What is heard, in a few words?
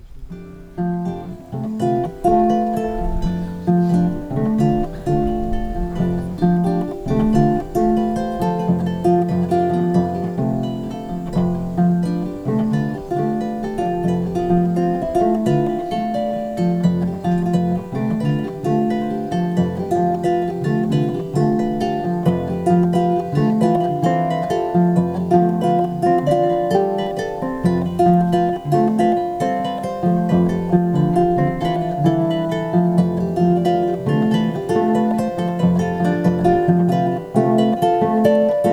Kora; mexico 2005